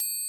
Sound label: angel, Triangle; Misc Percussion